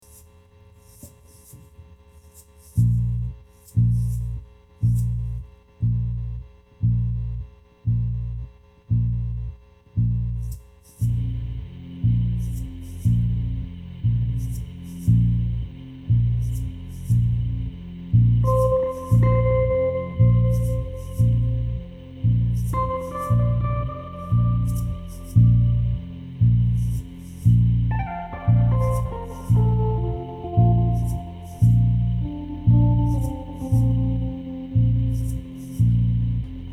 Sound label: bubbling; Vision Quest the 2016 annual edition of Planet Waves